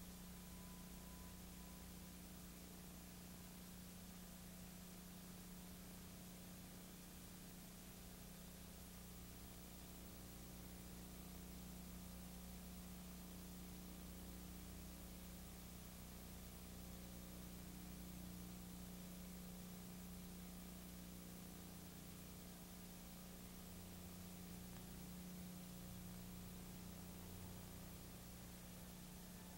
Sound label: line noise, Vinyl; Misc Percussion